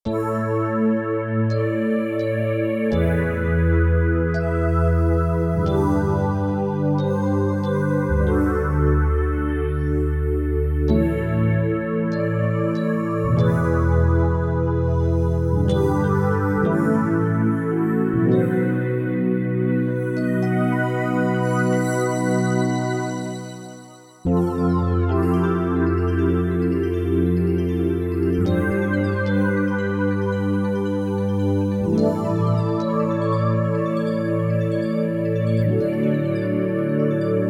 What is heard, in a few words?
Dyno Pad, Korg Radias-R